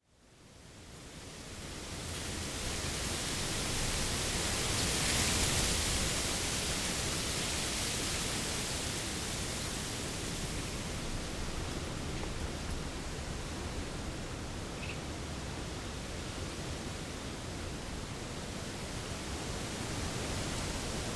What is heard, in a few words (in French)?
Vent Wind, Bellac France